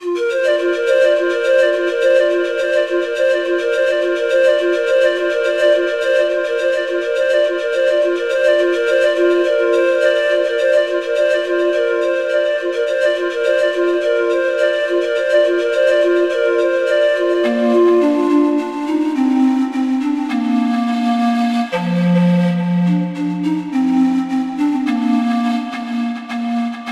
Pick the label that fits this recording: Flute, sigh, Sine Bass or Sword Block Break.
Flute